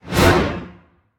angelic basic swing; Smite; Thanatos

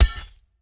sword drop; smite; gilgamesh

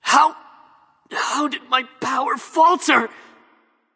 death; smite; nezha

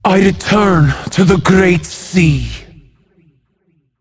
death; smite; tiamat

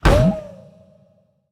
umbrella run impact; smite; vamana

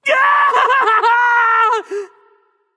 fear; smite; nezha

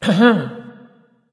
clear throat; smite; ganesha

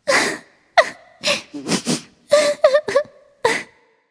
nv boohoo; charybdis

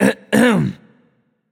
nv clear throat; smite; voicepack; mercury

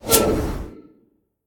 projectile; smite; gilgamesh

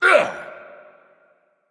grunt hurt; smite; npc; olympus

